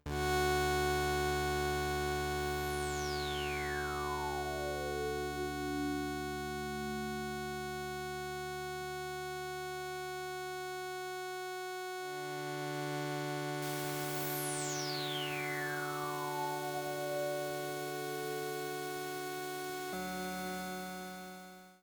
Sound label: D drone